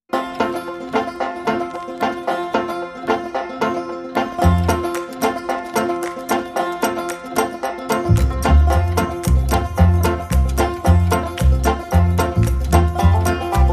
Hop Along Banjo, Light Mix

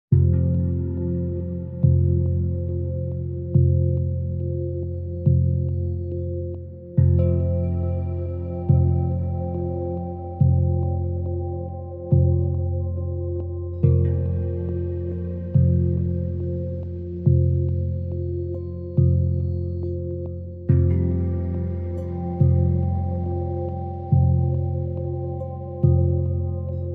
Dark Chime Meditation

